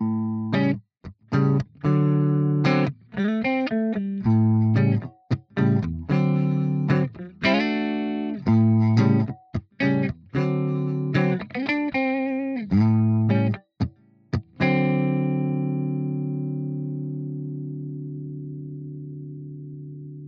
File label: california style on axis; two notes torpedo pi-101 testbericht